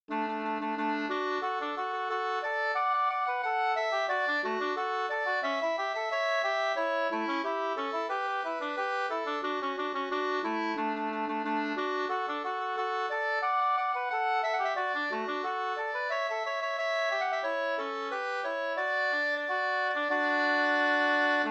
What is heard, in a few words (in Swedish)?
ganglat; fr Tunaberg e Rickard Forsman